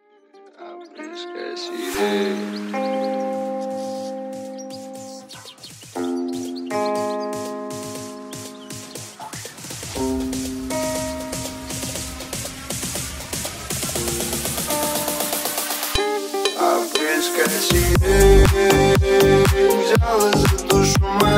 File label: remix